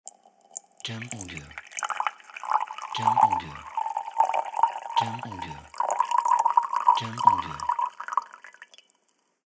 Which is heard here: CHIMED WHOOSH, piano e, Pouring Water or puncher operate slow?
Pouring Water